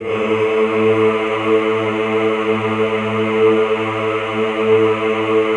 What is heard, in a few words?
EHH, Classical Choir; Best Service; Extended Classical Choir; EHH LO+HI LL